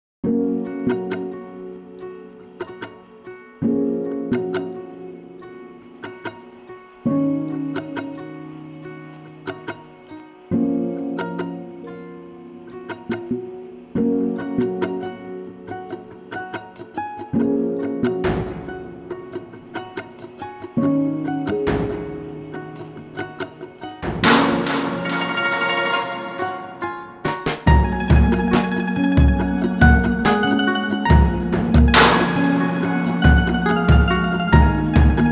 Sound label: balalaika